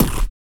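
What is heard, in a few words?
vox percussion, Vocal Planet Jazz FX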